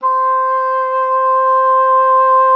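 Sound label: C5, oboe d'amore; Xsample VOL-7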